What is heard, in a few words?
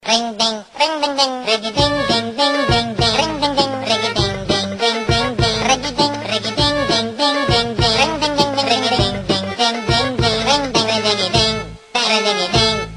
Arabic style ring